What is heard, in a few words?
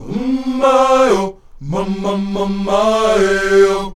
UM MAY, Afro Chants